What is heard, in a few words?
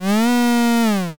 cow